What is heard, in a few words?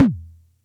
Music Percussion Computer Tom, Drum Machines